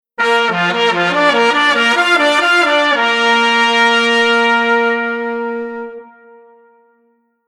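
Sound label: Horn Unison 2 Trumpets Trombone Tenor Sax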